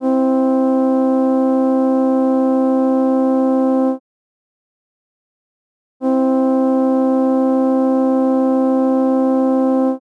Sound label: sea power stopped, Schallsignale